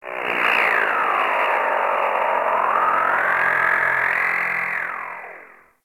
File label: Corpus Ship Data Low Drones, Ambience; Warframe; Corpus Ship Remaster